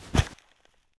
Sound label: Drop Sack; Civ4 beyond sword